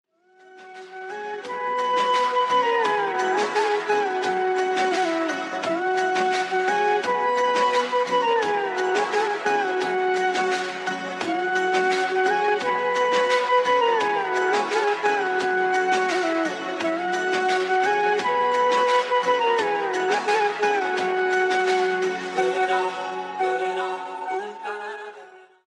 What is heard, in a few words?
ringtones instrumental